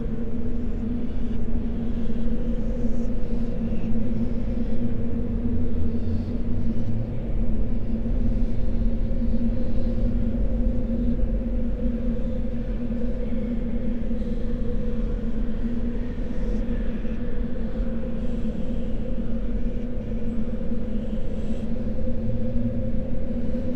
Ambient

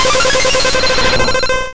Hyper Beam Laser; sfx; attack moves; Pokemon; gen 1; rby

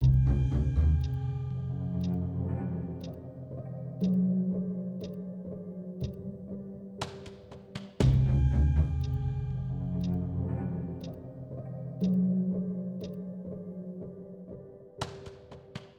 Time Shadows Calm

